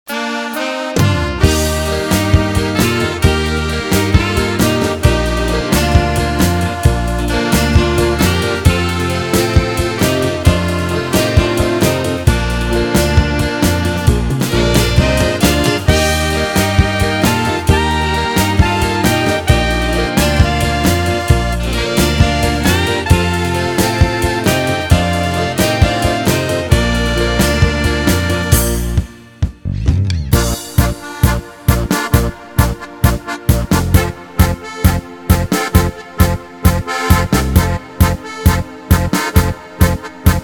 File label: Tango Beguine